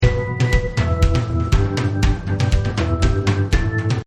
Halloween Loop; kickbuttowski; mellowbrook

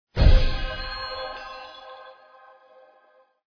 powerup explosion; fairies

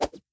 lasso throw; toystory; jessie

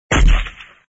rocket fire; marvel; city under attack

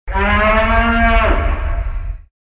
roar; cars; tractor tip; frank

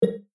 UI Button MouseDown; disneyxd; bryx

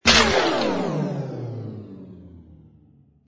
Drop; conservation catapult; project green

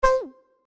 Title Screen Rollover; kritter krawler

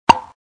fun button; Cars; tractor tip

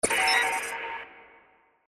section Enter; starwars; episode vii the force awakens

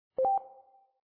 mouse click; fairies

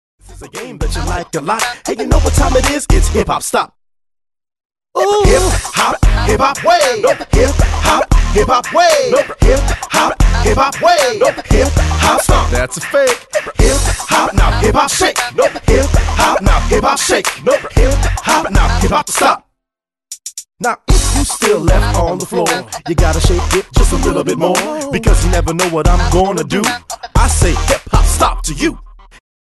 hip hop; waynebrady

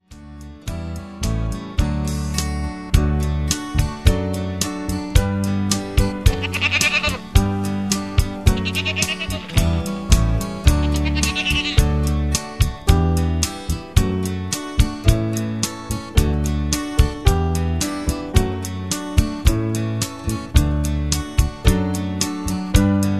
instr nursery rhyme medley; karaoke; disney; childrens favorite songs

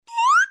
whistle; disney; mashup

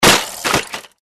crash bike; disneyxd; stuntastic

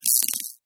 tesla coil electrical zap; Wreck-It Ralph

